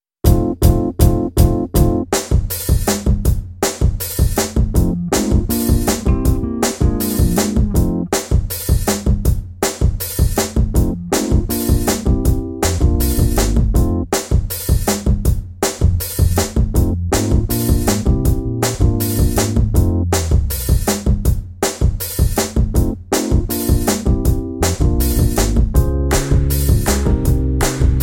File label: instrumental